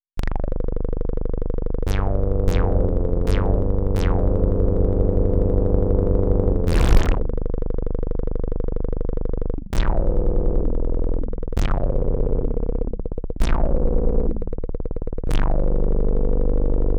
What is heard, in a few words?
space interlude